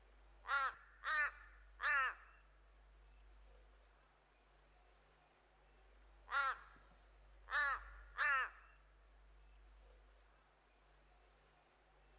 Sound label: crow, ambience